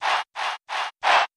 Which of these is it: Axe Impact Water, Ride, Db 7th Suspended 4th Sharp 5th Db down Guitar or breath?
breath